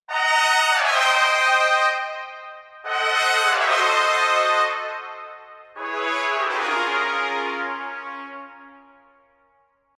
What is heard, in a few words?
Brass Ensemble Trumpets Step Down